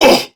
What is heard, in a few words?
Pain Prime Grineer Male; Tau; Battle Barks